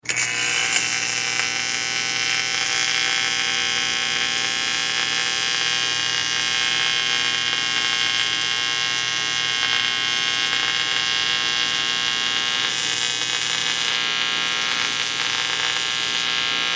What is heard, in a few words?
Industry Kick Welder On Run; Welders; Machines; SFX Bible